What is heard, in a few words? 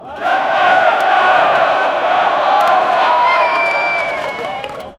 colosseum interior gladia, arena